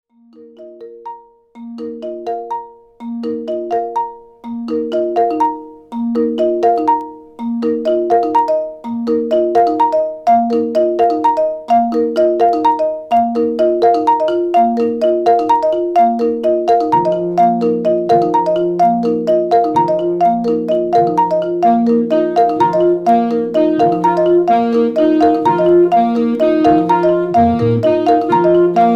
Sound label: studio percussion